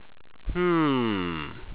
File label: hmmmm